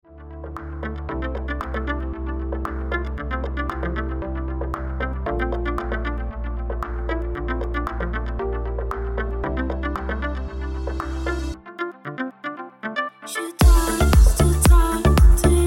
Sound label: Ringtone